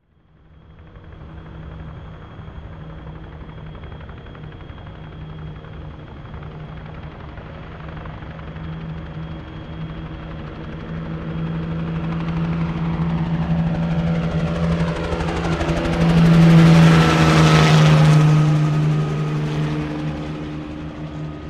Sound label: Helicopter By Medium, bell 47